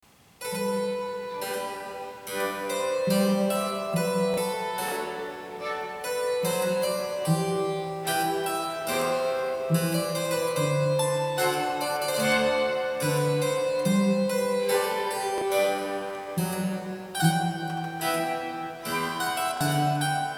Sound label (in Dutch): concert voor klavecimbel strijkers in f kl largo; J.S Bach; BWV 1056